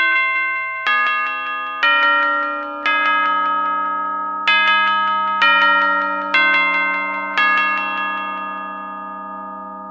clock chime; fnaf

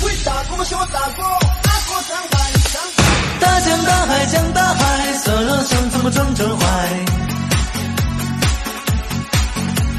new rap chinese song

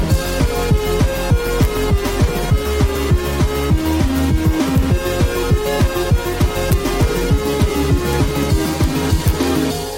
limbo keys

